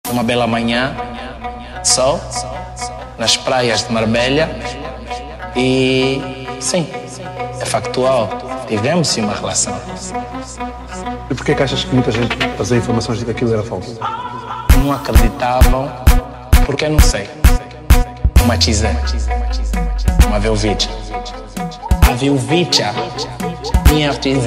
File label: Afro House